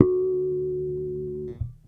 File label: Bass Harmonic Wiggle D, Electric Bass One Shots; Slate Digital BASSHOLE Sample Pack